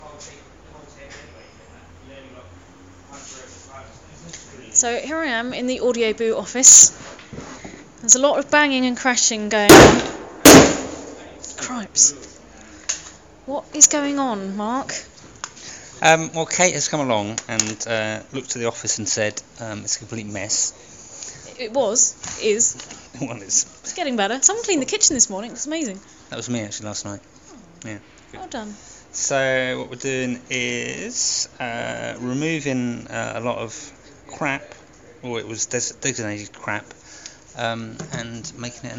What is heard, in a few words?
crash bang wallop it's the sound of the office; Audioboo office